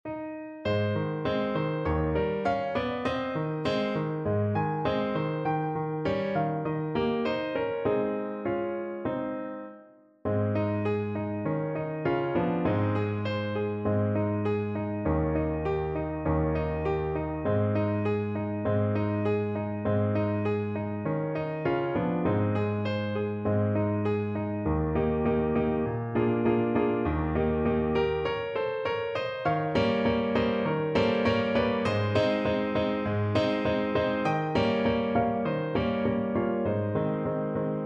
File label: French Horn